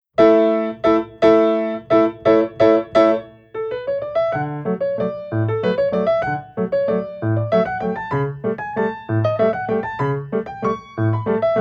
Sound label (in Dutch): Wals, Piano oefeningen; Wals uit Faust